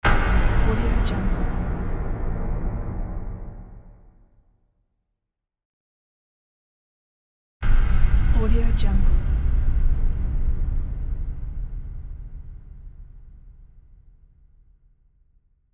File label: impact boom